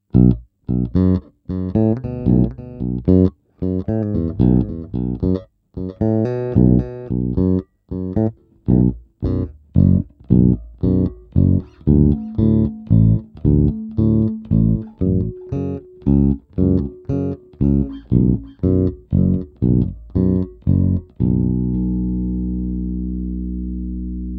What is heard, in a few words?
Delay